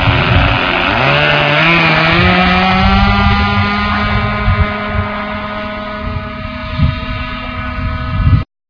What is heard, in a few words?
spaceshuttle takeoff